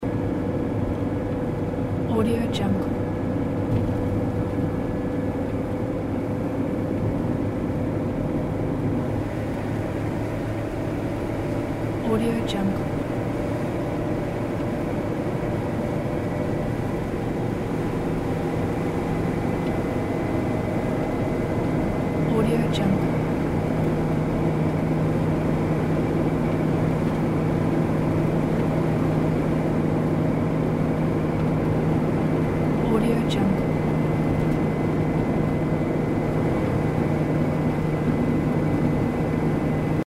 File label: interior of diesel van driving on highway